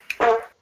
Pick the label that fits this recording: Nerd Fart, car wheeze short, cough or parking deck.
Nerd Fart